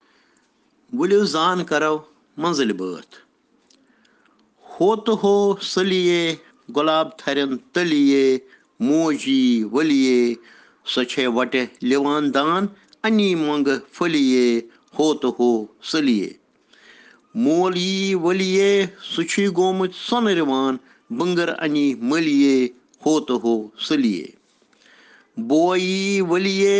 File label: Children Rhyms in Kashmiri